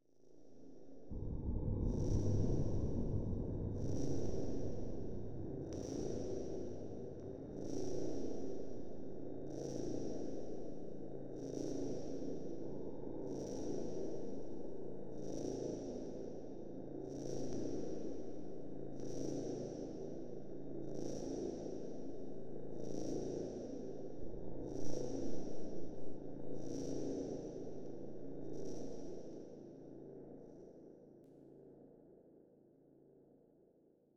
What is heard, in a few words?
Magnetic Field; 99Sounds; Magnetic Fields